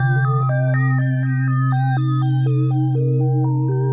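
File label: 122 BPM Sequence C major A minor Tonic C; innovative sequences 1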